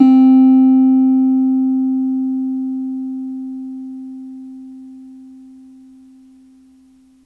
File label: Piano Clav c3 soft; TrashLibrary